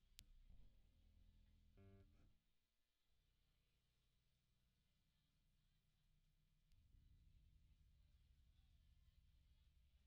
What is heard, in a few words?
Bass DI